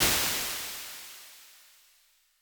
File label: PLATE L480, Impulse Responses; Reverbs; PastToFutureReverbs; CLA; IRs